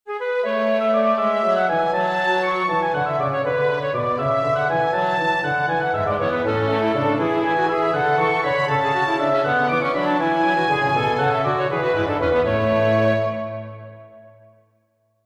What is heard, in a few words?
Organ Trio in A major Gigue